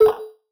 Vintage Percussion, Tone; Cymatics; Mystery Pack Vol 4

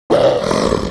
Creature Growl; Game Essentials

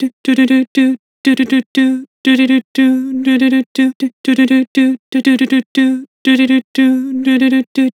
Vibrato "Doo", Vocals; Harmor Vocals

